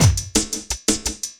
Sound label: LOOP, Drum & Bass, 170 BPM; Ueberschall; Drum & Bass Resonance